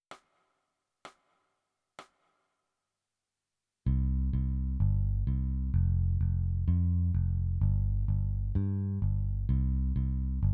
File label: Bass